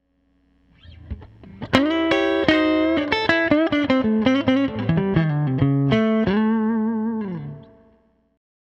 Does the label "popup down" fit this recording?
No